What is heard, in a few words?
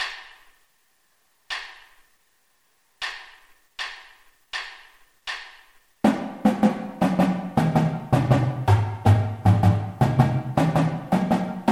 dos tres slow, marching drum music warm ups